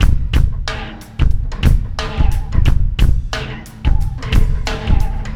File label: Landing Party Beat, Processed Drumkits